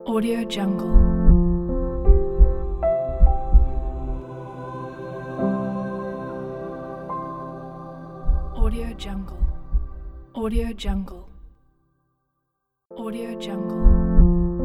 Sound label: soft piano logo pack; sunnydeemusic